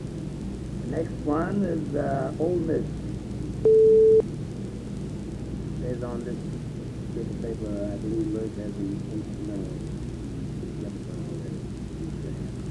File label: Blues